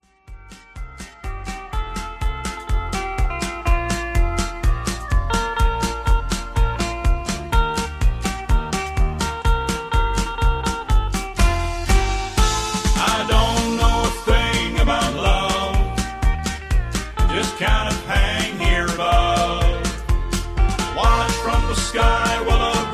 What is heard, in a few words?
Instrumental with Harmony